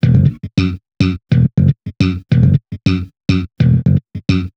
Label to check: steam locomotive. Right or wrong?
Wrong